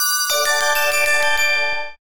win; Amatic; Casanova

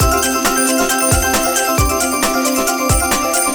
count up; Amatic; Dragons Pearl

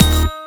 stop bonus; Amatic; Fruit Box

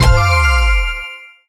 stop bonus; Amatic; Book of Fruits

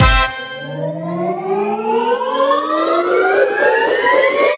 stop bonus; Amatic; Fortune Girl

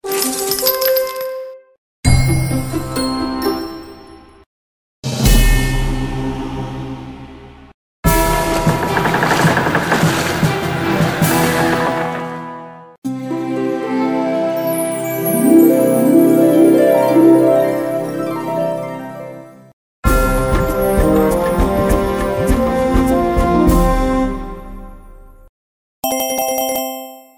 win sounds; EGT; Age Troy

